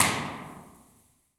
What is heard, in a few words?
Tijuana Aqueduct Tunnel, Impulse Response; EchoThief Impulse Response Library